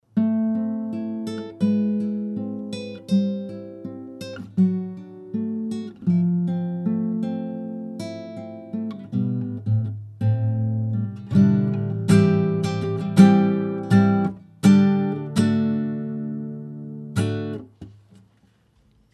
Audio-Technica ATR-1300 gitara nejlon, гитара (нейлон); Звучание Audio-Technica ATR-1300 — гитара (нейлон); динамических кардиоидных микрофонона; Рассмотрим 4 бюджетных динамических кардиоидных микрофонона от Audio-Technica, линейки ATR

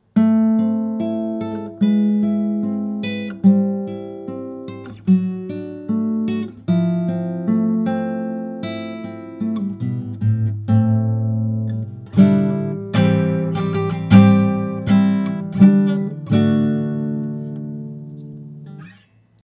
Audio-Technica ATR-1500 gitara nejlon, гитара (нейлон); Звучание Audio-Technica ATR-1500 — гитара (нейлон); динамических кардиоидных микрофонона; Рассмотрим 4 бюджетных динамических кардиоидных микрофонона от Audio-Technica, линейки ATR

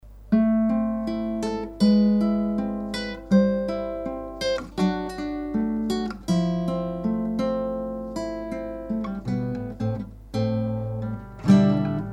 Audio-Technica ATR-1100 gitara nejlon, гитара (нейлон); Звучание Audio-Technica ATR-1100 — гитара (нейлон); динамических кардиоидных микрофонона; Рассмотрим 4 бюджетных динамических кардиоидных микрофонона от Audio-Technica, линейки ATR

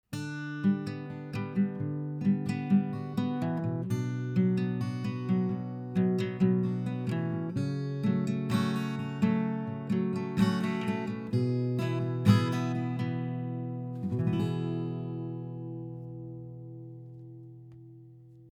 gitara perebor; Lewitt Connect 6 - Гітара перебор; Lewitt Connect 6 – аудіоінтерфейс за 300 $, з можливістю запису в двоканальному режимі

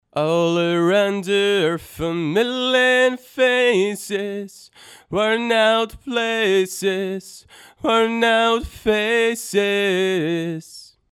Audio-Technica ATR-1500 golos, Вокал; Звучание Audio-Technica ATR-1500 — Вокал; динамических кардиоидных микрофонона; Рассмотрим 4 бюджетных динамических кардиоидных микрофонона от Audio-Technica, линейки ATR

